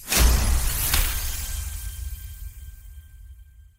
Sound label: dice roll success